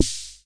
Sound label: rhma sd, Whippany Rhythm Master; drum machines; 1970s